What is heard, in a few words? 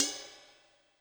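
Ride Sizzle, Cymbal; Yamaha DTXpress-II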